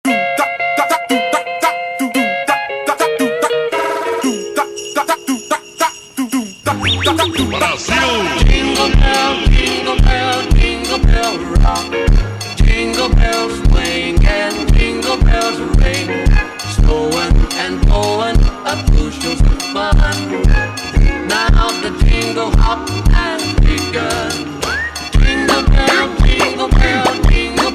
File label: Jingle Bells Funk slowed